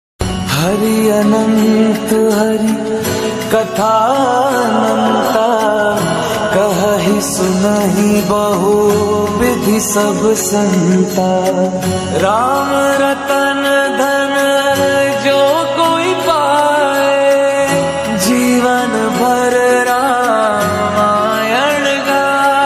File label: bhakti ringtone